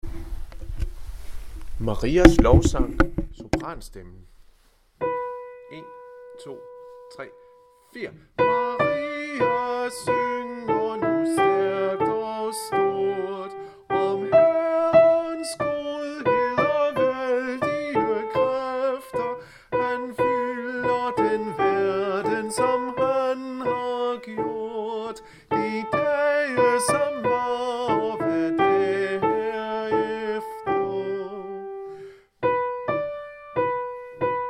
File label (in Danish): sopran; Marias lovsang